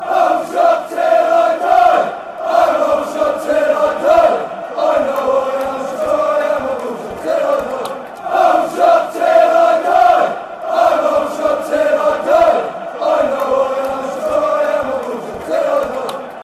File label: aldershot til i die, fanchants